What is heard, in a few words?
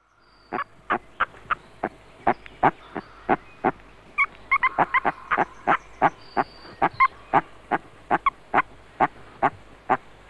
Rana arvalis